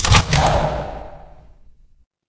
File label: door open